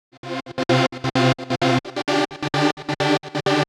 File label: Gated Synthline (130BPM); creative gate; Loops; musicradar